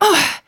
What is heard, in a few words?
voice female hit